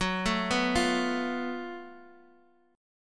F sharp Minor Major 7th Double Flat 5th, Guitar Open D; chordshape; down